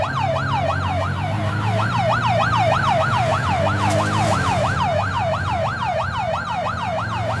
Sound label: emergency siren